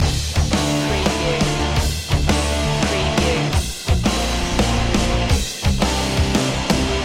8bit rocktronic loop

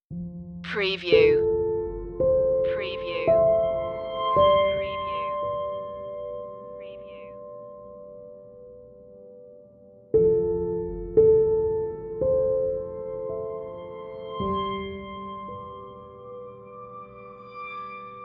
romantic reflective

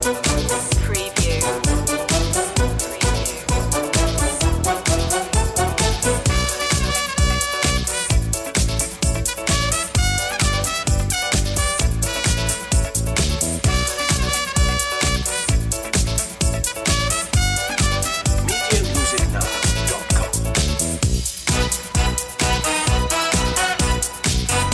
funk jazz; media music now

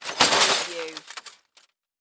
Debris Metal Drop

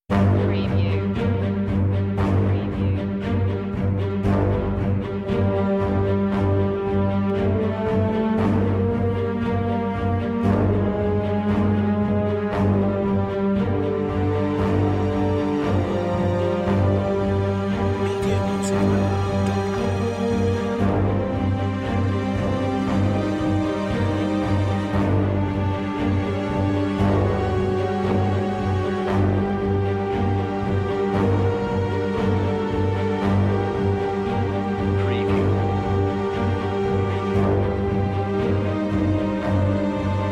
critical situation no percussion

